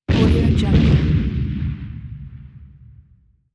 explosions

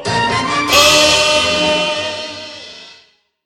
achievement; bomb squad

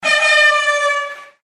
airhorn doubletap; vic b air horn double

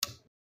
click

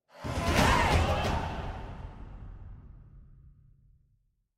level up; witcher 3

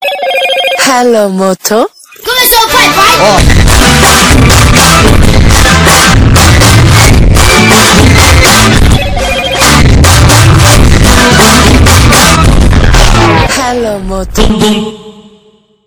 hello moto remix

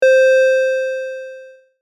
triangle beep long

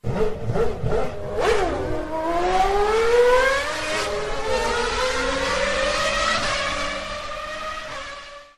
f1 sound

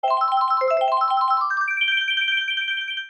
item box sound; mario kart

